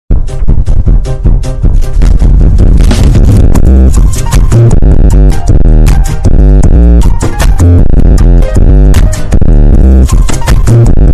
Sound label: bad piggies bass boosted